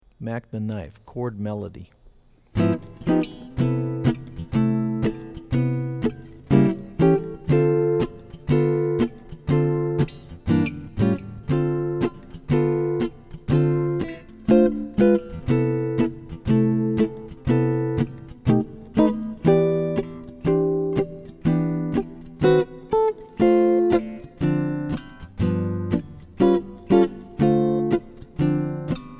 Chord Melody; jazz tunes

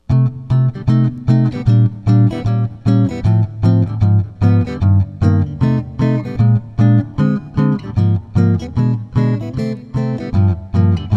Chorus, jazz tunes

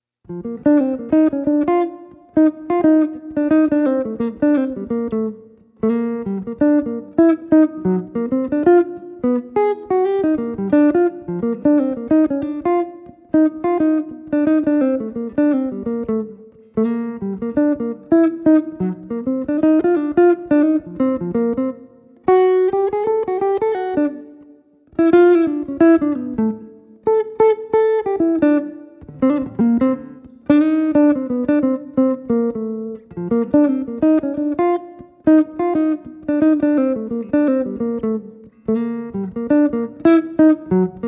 melody, jazz tunes

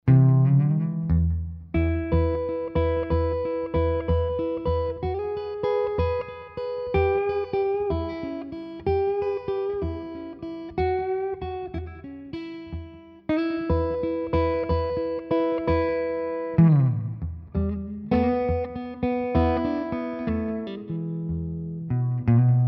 Intro, dead thumb fingerstyle tunes

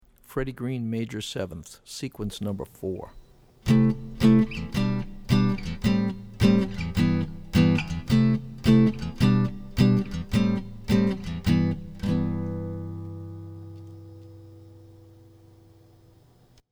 Maj7ths Seq; jazz chording; Freddie Green

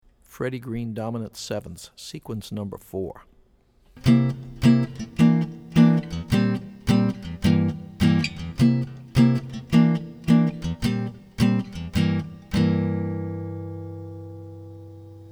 D mnt 7ths Seq; jazz chording

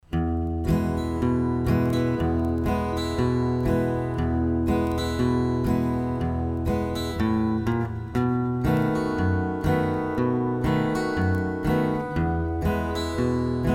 strum style tunes

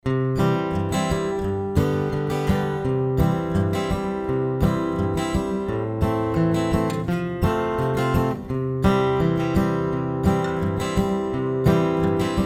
Strum accompaniment; strum style tunes

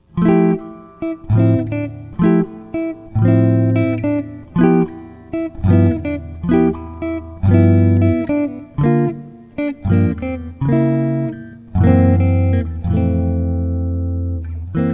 Chord Melody; jazz tunes

